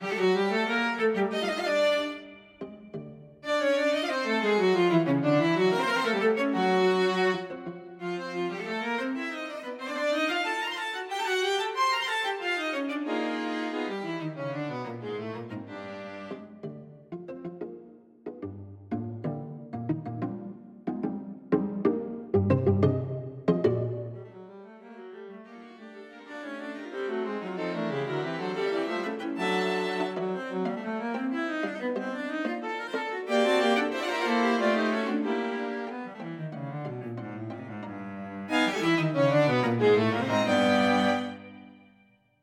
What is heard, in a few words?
String Quartet I